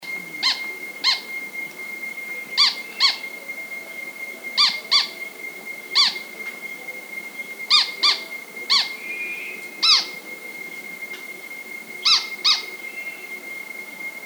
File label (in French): egothele montagnard